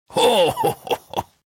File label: panda Fist Pump; shangrila